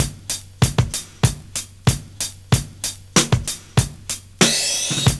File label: PHASE CYMB, Kitch 70s; Univers Sons